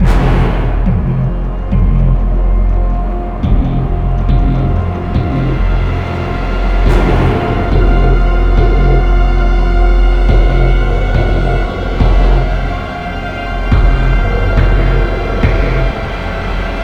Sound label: Suspense